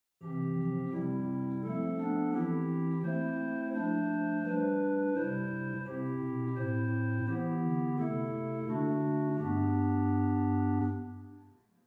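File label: Lieblich Gedackt 8, organ; Hauptwerk; St Annes Moseley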